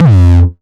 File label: 909 Grime Layer 2 - F2, Drums, Kicks; Mbase 11 Kicks